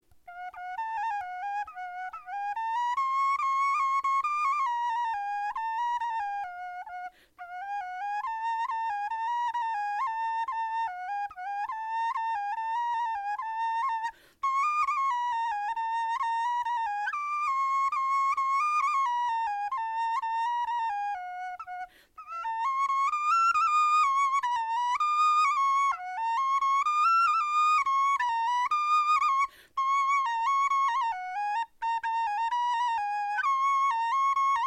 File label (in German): melodie, knochenfloeten